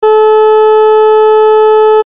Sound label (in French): scie